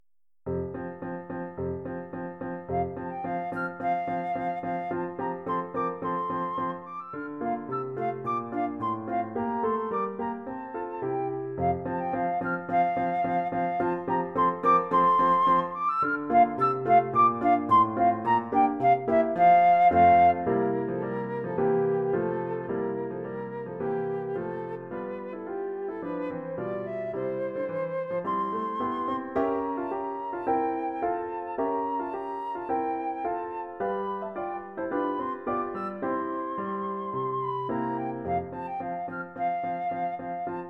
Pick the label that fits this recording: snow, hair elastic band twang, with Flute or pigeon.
with Flute